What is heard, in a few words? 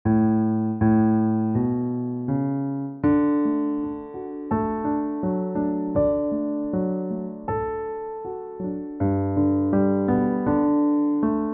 Piano; Da Slockit Light